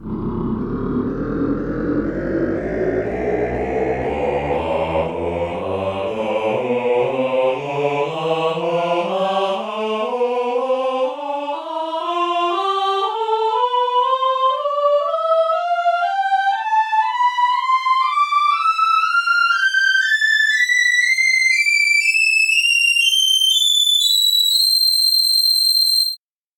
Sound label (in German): ahh choir tonleiter